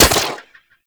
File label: Speeder Rifle Fire